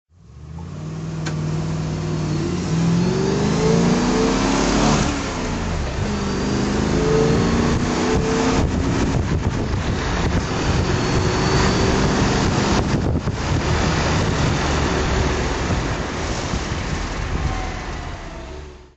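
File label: mgbgt v8 drive, engine sound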